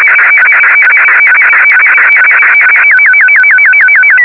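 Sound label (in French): SSTV Martin 1, Slow Scan TeleVision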